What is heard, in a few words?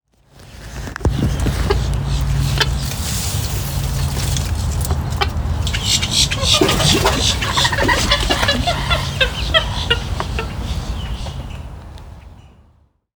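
Porphyrio martinicus, bird; گالینول بنفش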